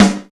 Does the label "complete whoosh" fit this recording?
No